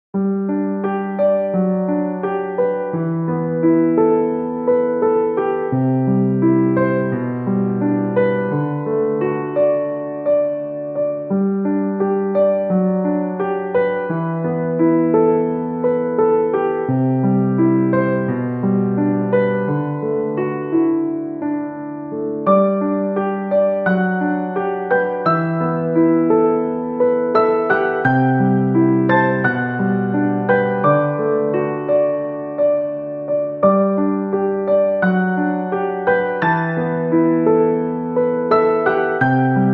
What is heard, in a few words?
Background music for wedding